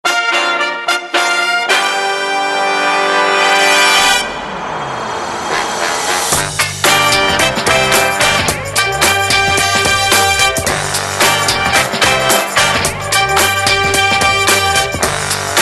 lightning jazz

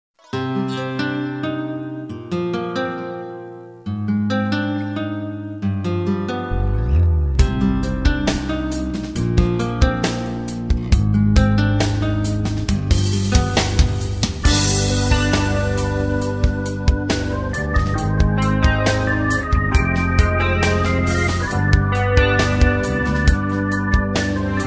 fall guitar

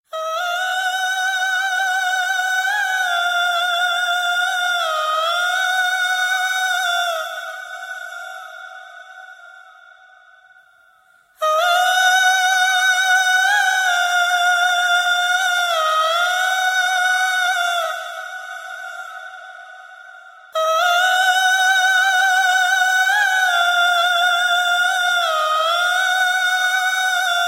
sad voice

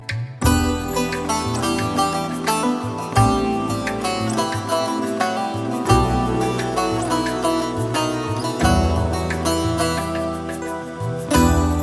instrumental

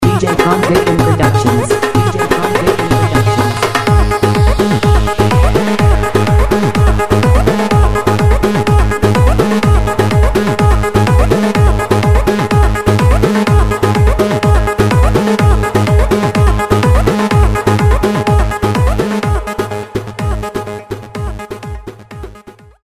violin, ringtone

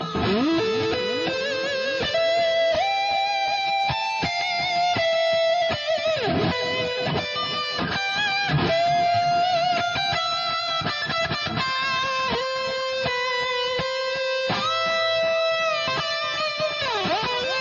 guitar

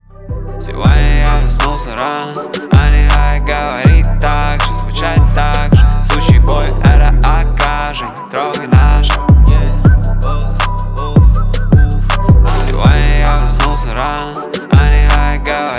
whistle song

